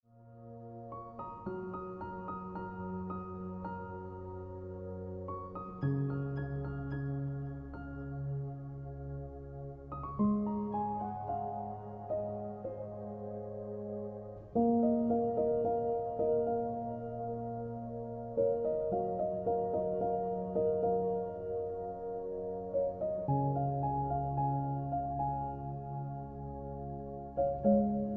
Celestial Ringtone

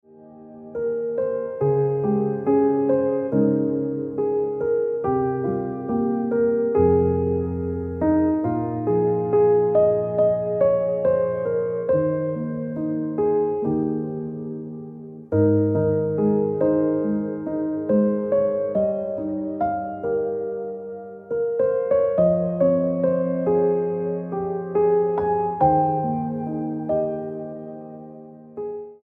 Ringtone Piano Music

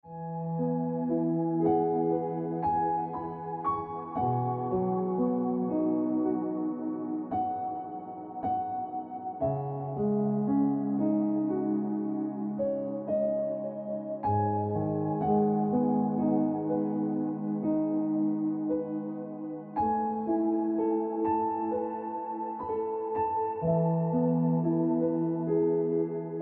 Ringtone Piano Music

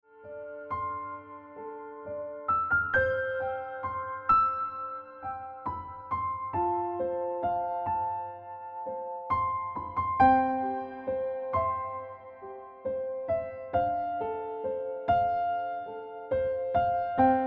Ringtone

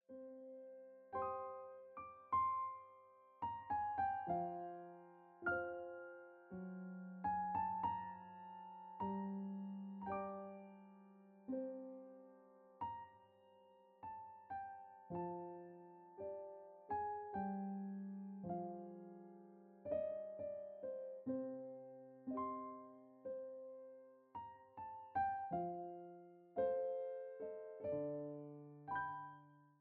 Cool Breeze Ringtone Piano Music

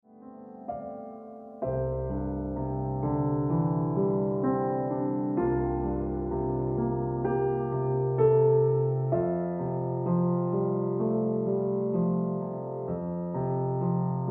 Early Sunshine Ringtone Piano Music

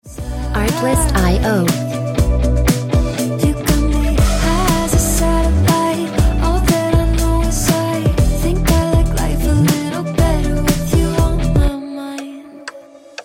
Ringtone

